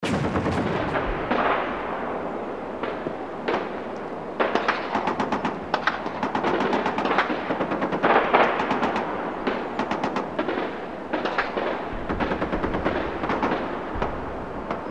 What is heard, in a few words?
ambience; dod